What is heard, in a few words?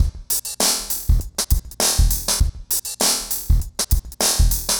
Remixed Drums 100BPM; The Roots Drumloops